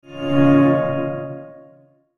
Whoosh Tonal Ring; Blastwave

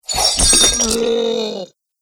Heavy Chain Weapon Hits Monster; Battle Sound Effects

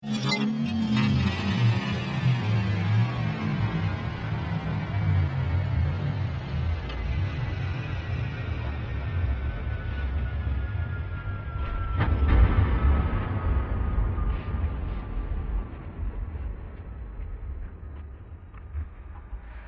Ufo Ultimate Slow Landing; Spacecraft Sound Effects

